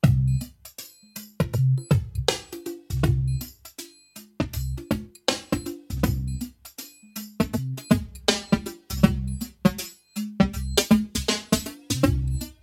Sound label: Flanger Drums Bass Loop